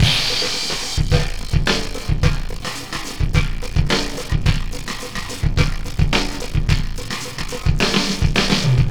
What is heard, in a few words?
System Overload Beat, Processed Drumkits; Sonic Foundry (Sony Creative Software); Processed Drumkits Zero Gravity Beats